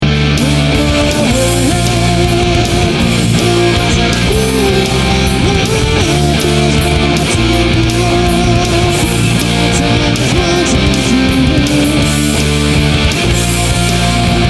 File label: Pro Guitars